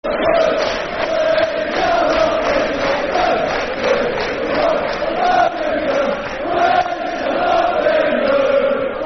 We Shall Not Be Moved Football Chant - Aston Villa; Aston Villa Avfc